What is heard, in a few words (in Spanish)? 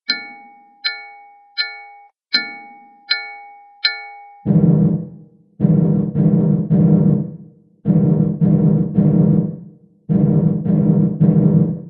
fanfarra tercera voz